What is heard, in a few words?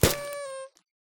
present open; WaterTreatment